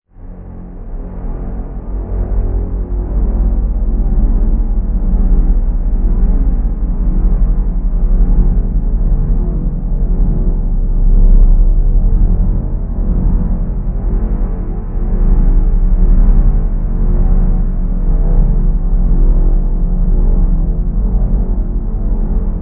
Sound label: Cinematic Ambient Deep Sub Drone